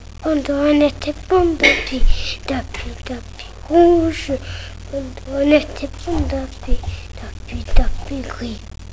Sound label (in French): chant